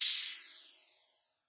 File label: Processed Ethn Perc, Doru Malaia Percussion; ETHNIC and WORLD PERCUSSION